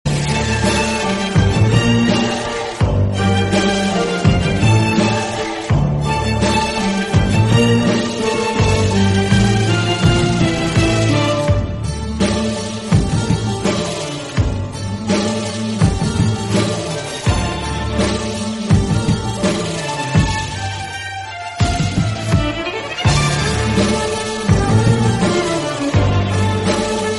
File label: web series bgm; rocket boys; jim sarbh; ishwak singh